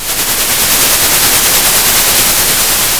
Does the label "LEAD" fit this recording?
No